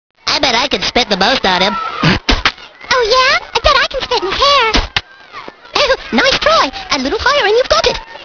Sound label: spit